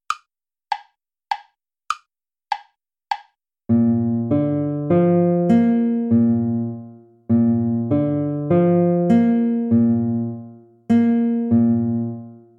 allegro con spirito play along; hook james

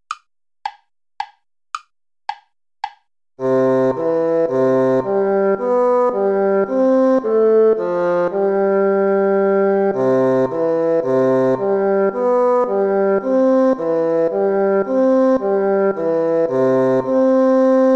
tempo minuetto

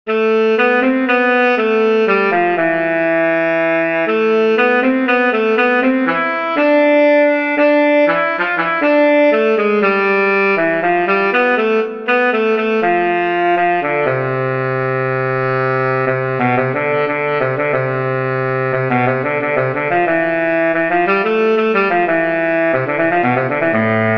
for tenor saxophone; bayes steven

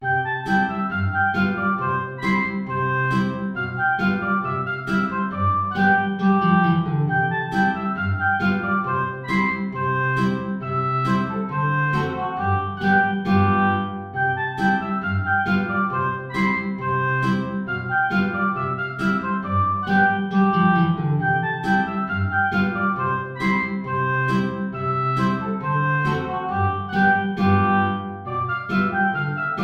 gavotte; gossec francois joseph

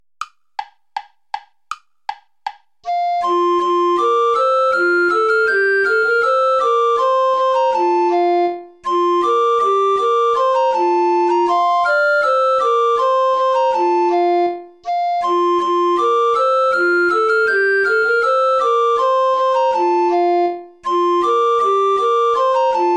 play along without alto recorder; weelkes thomas; since robin hood

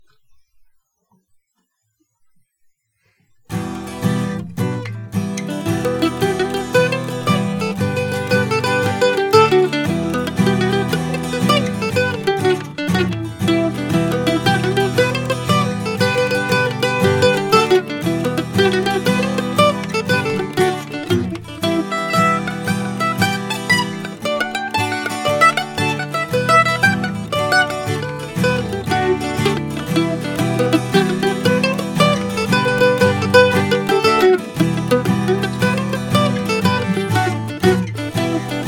traditional jig; gallagher frolics

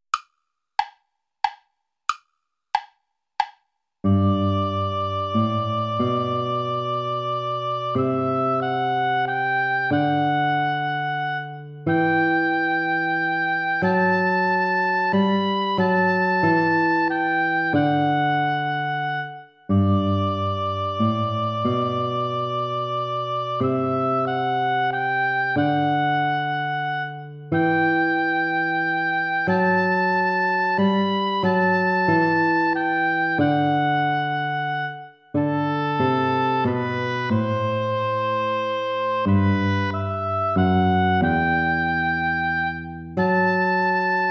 sarabande play along without oboe

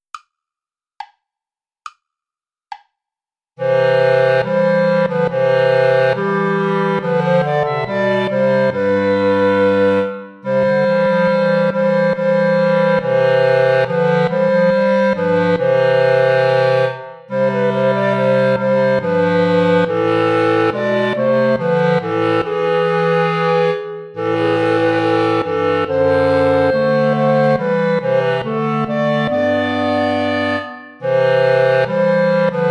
play along without clarinet; haendel georg friedrich; zion daughter rejoice

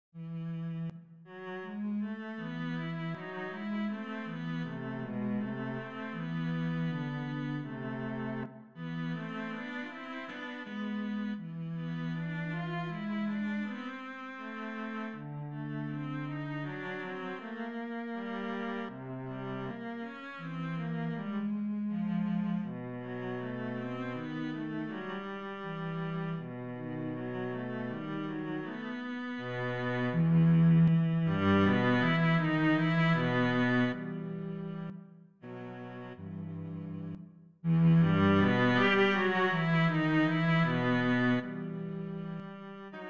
duo concert; blazhevich vladislav

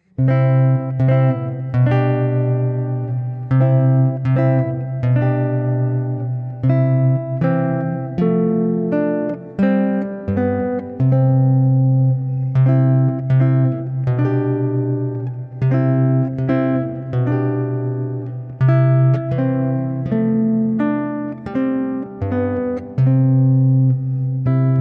allegretto theme variations; giuliani mauro

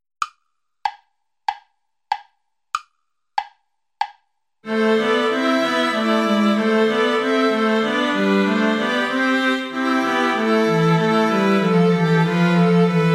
play along without violin; bach johann sebastian; ich steh deiner krippen hier

